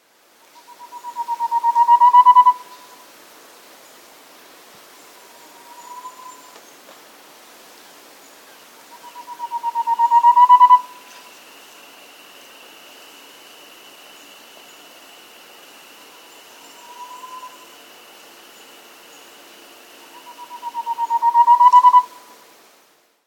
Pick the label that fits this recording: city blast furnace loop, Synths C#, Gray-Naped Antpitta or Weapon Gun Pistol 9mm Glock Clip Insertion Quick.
Gray-Naped Antpitta